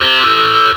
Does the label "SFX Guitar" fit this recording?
Yes